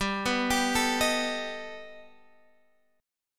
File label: G Augmented Add 9th, chord